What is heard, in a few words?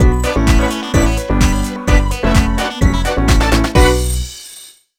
Win Game